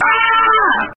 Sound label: ahhhh